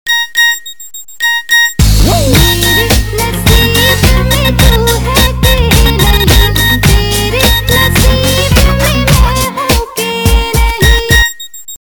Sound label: smart sms tone